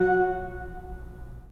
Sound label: 3D Pipe Mix Celeste, 3D Organs; E-MU Producer Series Vol. 5; 3-D Audio Collection